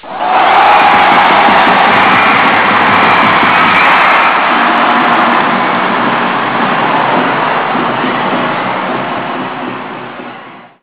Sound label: fans